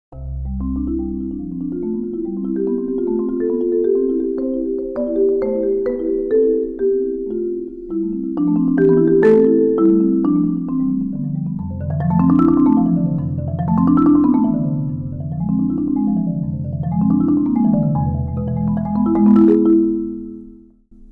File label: marimba; idiofony